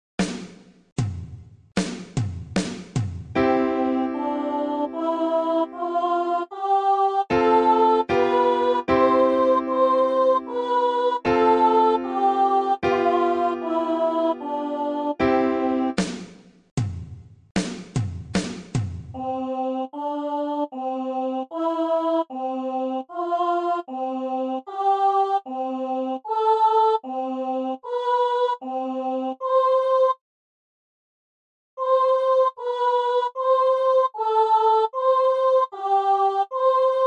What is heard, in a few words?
c scale and intervals